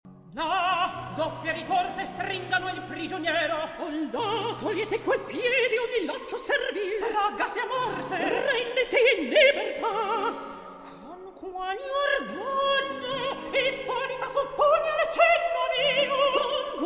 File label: Recitativo; Antiope, Ippolita